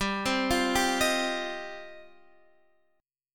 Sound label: G 7th Suspended 4th Sharp 5th, Guitar DADGAD